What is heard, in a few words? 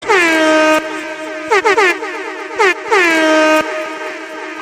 trap horn